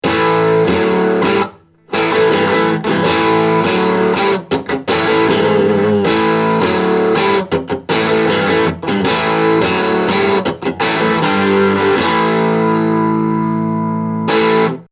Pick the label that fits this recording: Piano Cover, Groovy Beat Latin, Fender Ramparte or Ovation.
Fender Ramparte